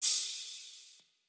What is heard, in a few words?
94bpm Gmin Crash Melodic